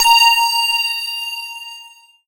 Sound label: BELL-GONG, A#; Big Fish Audio